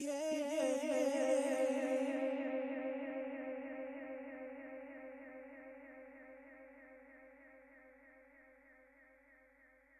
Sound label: FX, viral hiphop